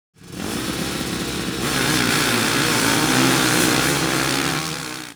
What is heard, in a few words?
Start, UI